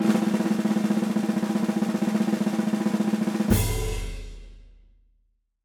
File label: Room Drum; Yakuman Houou; 3DS, Wii U